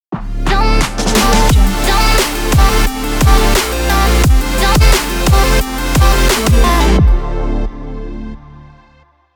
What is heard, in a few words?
dubstep logo intro